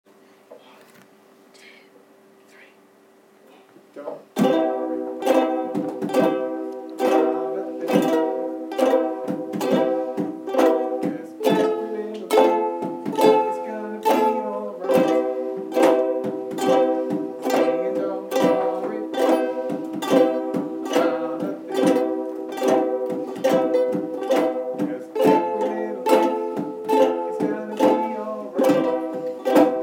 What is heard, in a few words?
ukulele